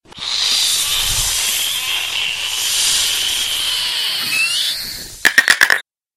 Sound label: cuco